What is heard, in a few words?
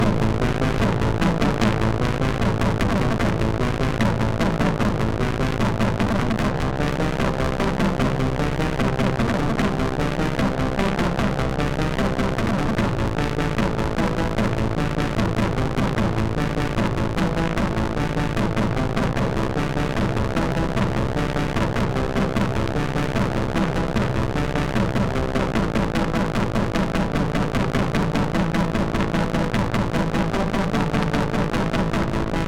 Machine, MOS6581